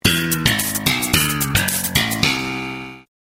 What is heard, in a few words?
Sitcom Bass Riff